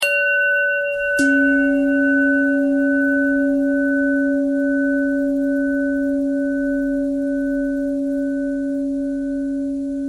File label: bell bell thread noise